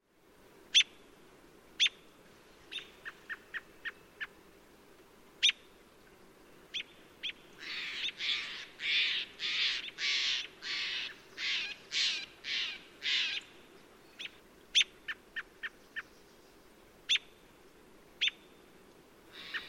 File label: Robin Scolding Steller Jay; Sitka